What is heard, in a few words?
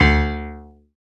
PIA, PIANOS